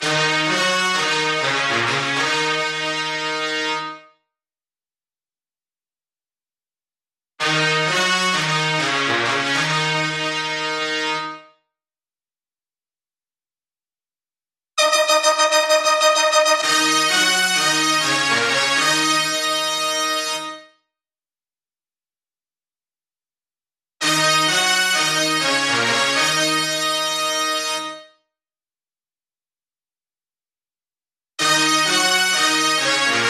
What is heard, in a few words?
Brass